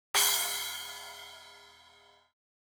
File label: Zildjian Splash; hydrogen